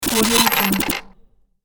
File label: cryptocurrency glitch